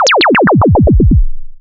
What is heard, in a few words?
Zapps, sci-fi